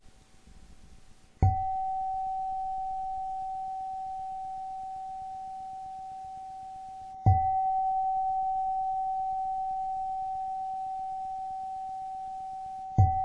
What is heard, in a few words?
washed mani bowl